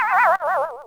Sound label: Patooie Die